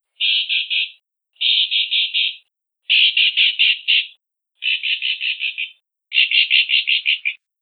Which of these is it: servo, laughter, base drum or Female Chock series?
Female Chock series